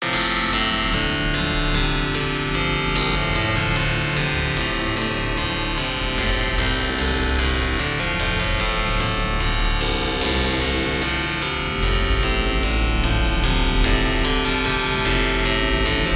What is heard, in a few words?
Noise for Strings; beepblip; Noise for Strings vol.2; Kamizdat, 2020